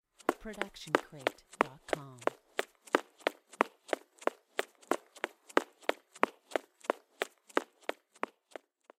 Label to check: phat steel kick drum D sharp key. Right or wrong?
Wrong